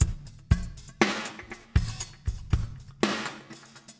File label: Drama Drums (120BPM); musicradar